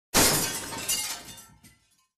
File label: Shattering Window